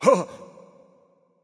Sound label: surprised; bacchus